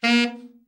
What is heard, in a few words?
TENOR SN, Sax; TENOR SHORT; Giga Samples Collection